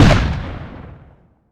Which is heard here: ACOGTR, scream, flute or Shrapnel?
Shrapnel